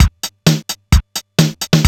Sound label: RZ-1 + LOOPS